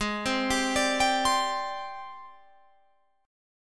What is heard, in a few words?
G sharp Major 7th Flat 5th Guitar Open F; G#M7b5 Chord